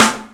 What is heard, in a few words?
Raw Snr; Rawcutz - Nu Forms